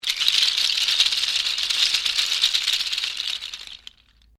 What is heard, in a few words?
RATTLE SEED CAPSULES SHAKE, Musical rattle made from seedpods; Rattle Sound Effects